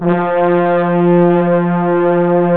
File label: F3 UNI BRASS; ORCH.; ORCH.COMP